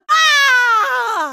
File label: impaled scream; mk9 jade